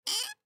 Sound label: Wood Gate Hinge, Wood Gate Hinge Creak; Gates; Doors; Foley; Blastwave